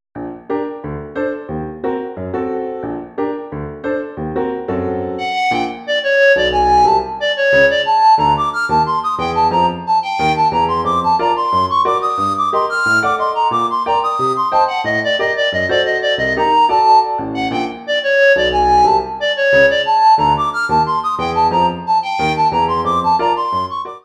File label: Rag, recorder